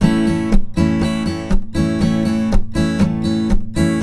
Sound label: RIFF, Blues Guitar; ILIO; Fretworks